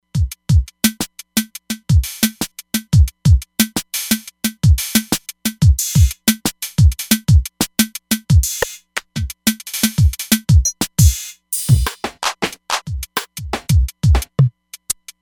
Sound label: Machine & Hip; Drums